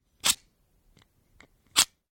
Flick Knife; Flicks; Objects; Tom Hutchings

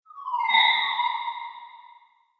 Time Warp, sci fi

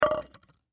Cups Plastic Down; Blastwave

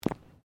FOOTSTEPS FOOTBALL BOOTS CONCRETE STEP; Footstep Foley; Human

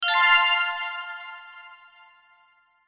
Musical Twinkle; Musical Twinkle Ascend Reverb Delay; Miscellaneous Musical Sound Effects

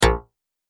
Multimedia Button; Business Programs, Apps And Computing Noises; Andrew Fleming

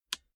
Car Classic Bentley Eight Seat Heater Switch On; Transport; Car Sound Effects

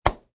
Slap Noise

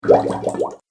Goop Sound, cartoon

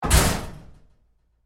Door Locker Impact Roomy Hard, Metal Door; Doors; Big Room Sound

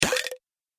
Item Drop Game Fx, Video Game Sound Effects

